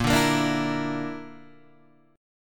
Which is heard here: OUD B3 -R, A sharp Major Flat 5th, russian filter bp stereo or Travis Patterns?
A sharp Major Flat 5th